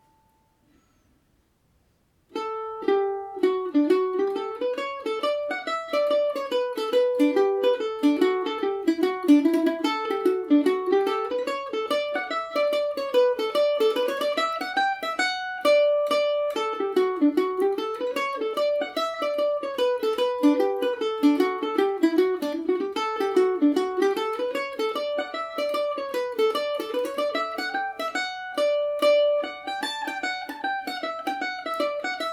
staten island hornpipe dmaj, mandolin

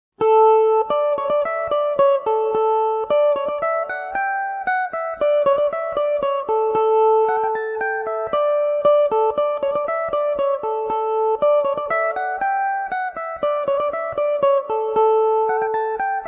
jim keeffes polka dmaj, mandolin

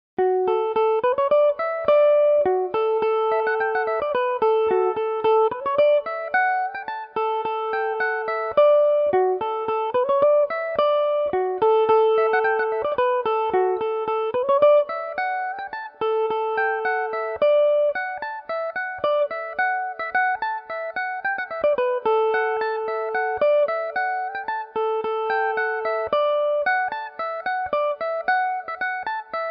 happy weve all been together dmaj, mandolin; Revelation Jaguar electric mandolin

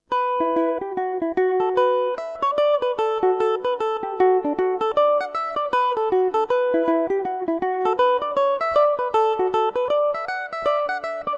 lough mountain edor, mandolin

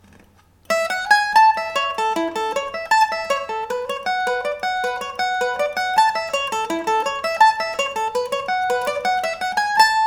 mandolin; cardiac hill

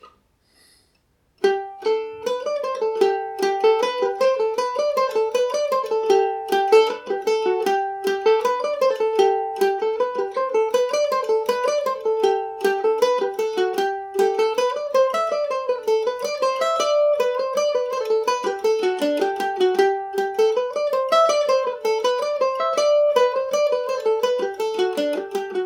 gmaj, mandolin; The Kesh Hill; G&O #34 mandolin

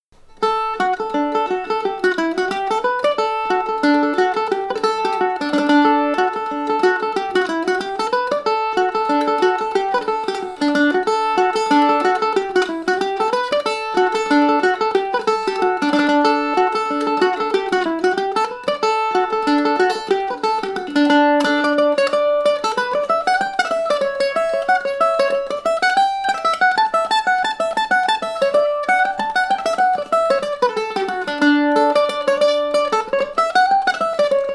cameronian, mandolin

